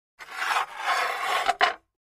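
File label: Large Tile Square Scrape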